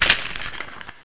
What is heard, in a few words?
die, skeleton